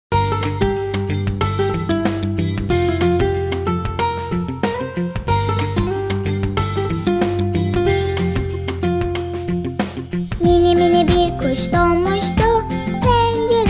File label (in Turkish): Mini Mini Bir Kuş Şarkısı